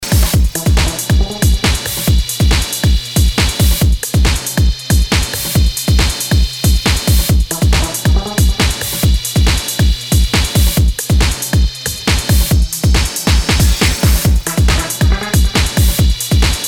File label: Static Charge